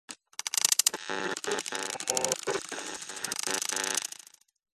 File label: science fiction steam punk machine operate clicks static radio bursts; audio mangler